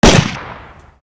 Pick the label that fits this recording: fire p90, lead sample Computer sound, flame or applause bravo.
fire p90